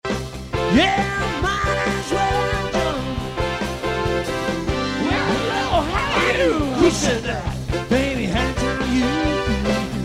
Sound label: music